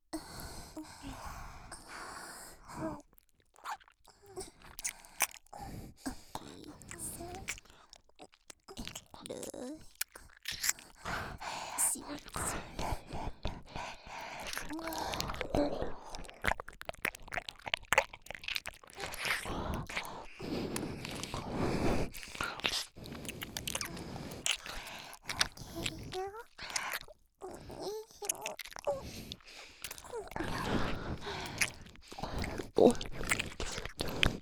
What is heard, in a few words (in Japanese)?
鼻息耳舐めれろれろ; ドスケベクールなにわかコスプレイヤーのフェラチオ＆媚び媚びゲップお下品エトセトラ; JKツインズ